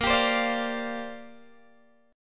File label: A sharp 6th Add 9th, Guitar, Open G; down